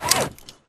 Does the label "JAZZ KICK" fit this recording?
No